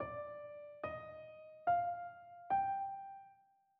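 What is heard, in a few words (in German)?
Tetrachord Phryg d auf, phrygisch; Tetrachorde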